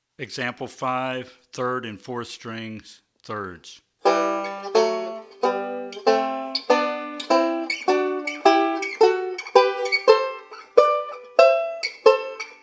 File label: Thirds On The 3rd And 4th Strings